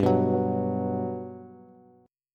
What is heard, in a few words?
G Major 7th G down Bass; GM7 strummed